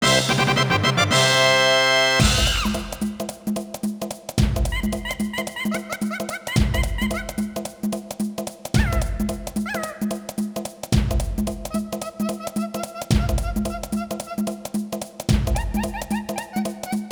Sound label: Race Win; Donkey Kong 64